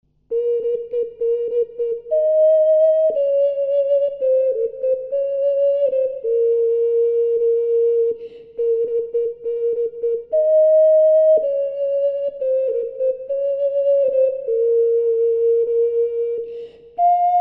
hinehmahtov, ocarina

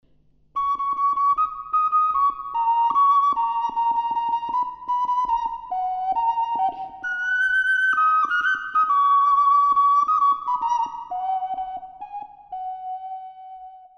kookaburra, Ocarina

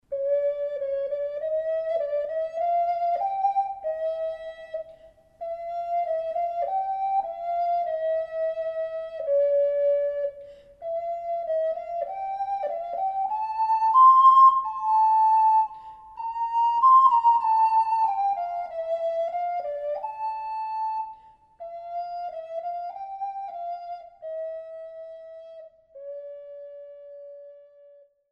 Anneli, ocarina

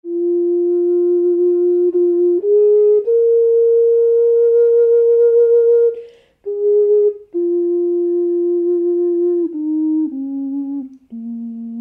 B impro, ocarina

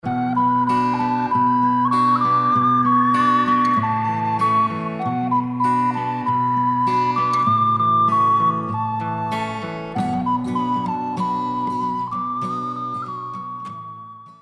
Spirit of The Wind, ocarina

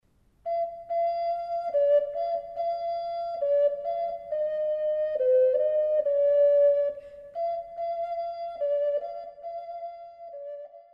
Ihr Kinderlein, ocarina

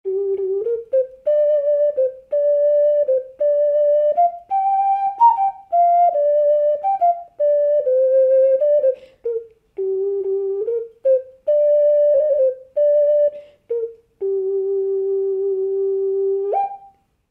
G impro, ocarina

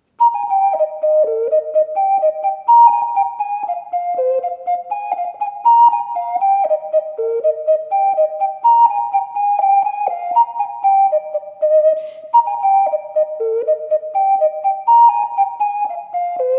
irish washerwoman, ocarina

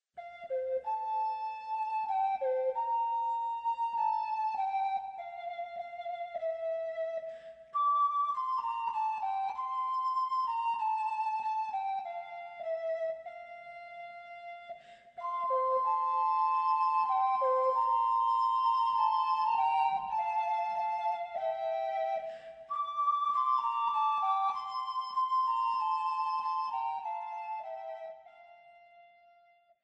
Dona Nobis Pacem, ocarina

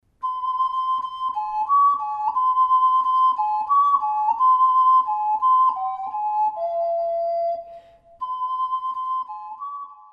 Kommet ihr Hirten, ocarina

